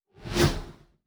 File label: Fly By, Sci-Fi Sounds Movement